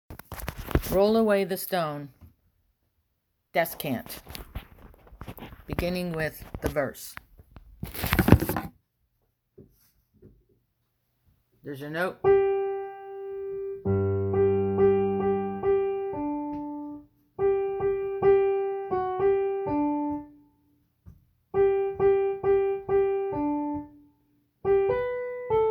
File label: descant